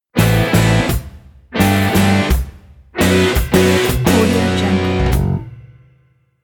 scene transition rock; lokohighman

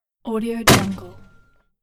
single mortar shell firing

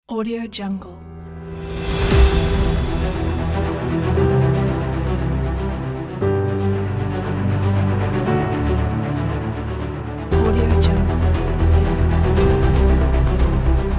epic

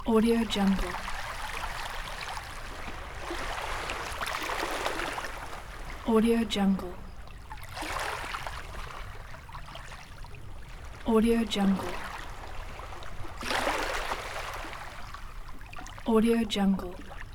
beach at night ambience; albasfx

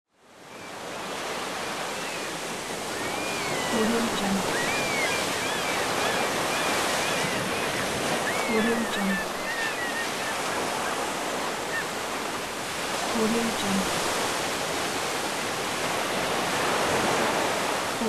beach ambience

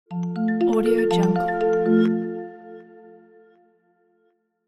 for logo

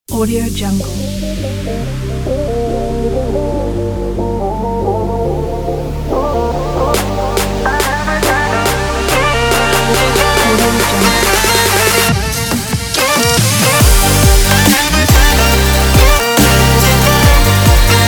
dubstep

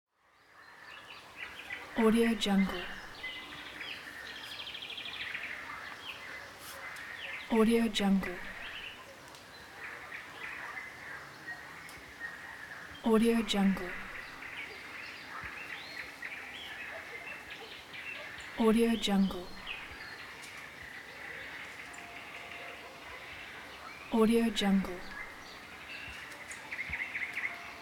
forest

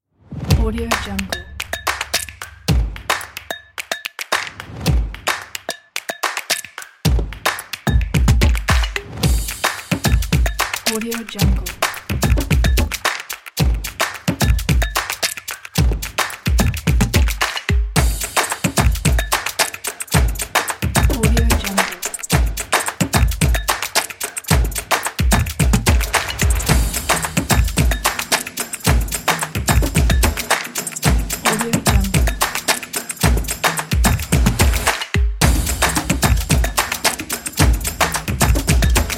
drumming kit

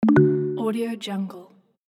invite to chat room ding

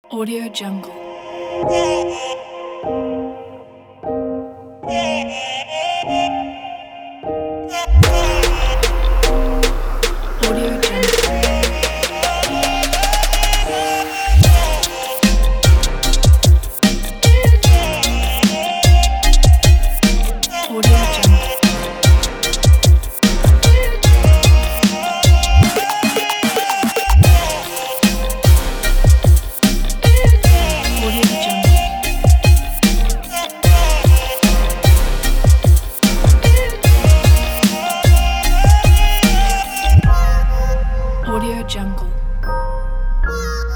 summer upbeat future bass

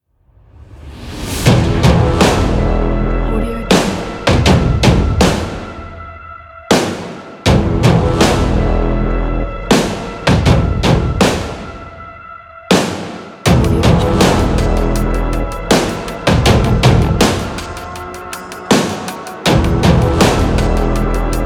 epic hybrid power logo

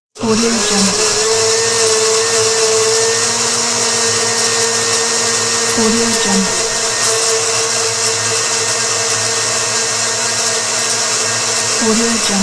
blender on blend

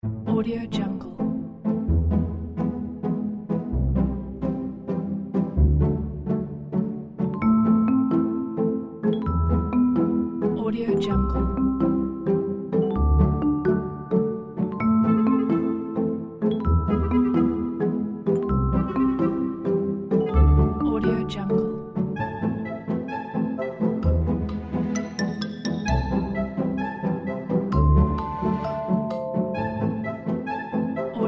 funny; delightmusicstudio

